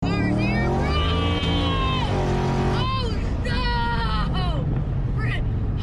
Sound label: omg car